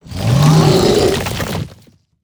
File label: Stone Golem Attack